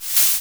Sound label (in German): landen Jump; Soundeffekte